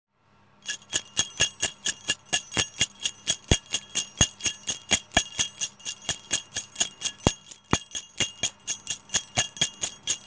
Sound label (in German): Glocke Messing